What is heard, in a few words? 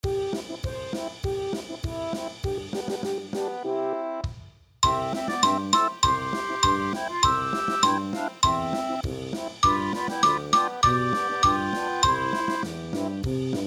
polka xylo